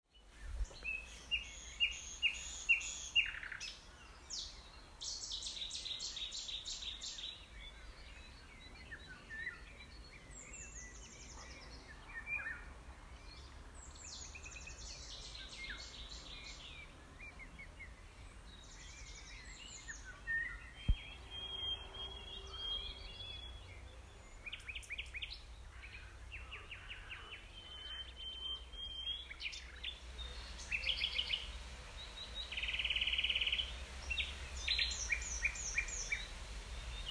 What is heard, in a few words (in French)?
chant oiseaux